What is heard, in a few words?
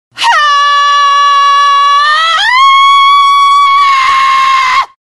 pain pain